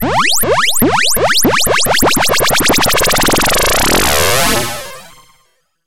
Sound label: Techno Fade In